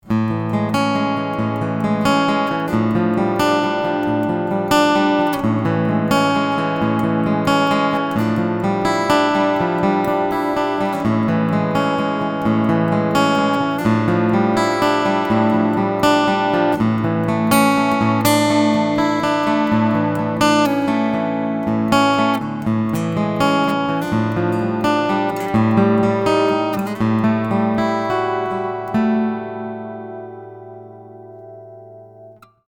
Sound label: reverb mod; zoom a3